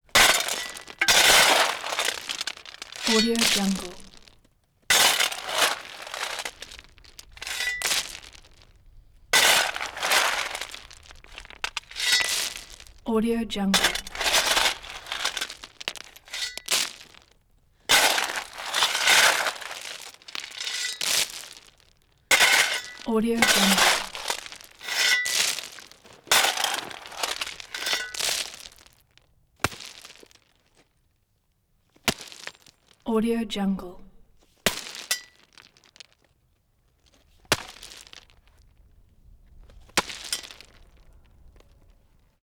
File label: shovel scoop up ballast and drop load